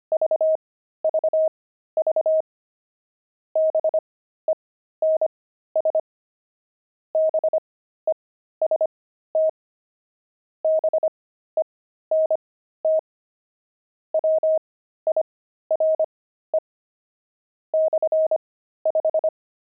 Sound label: pangram, intermediate pangrams files